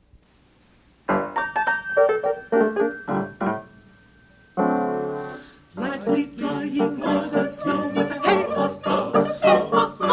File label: The Sleigh Alto, Choir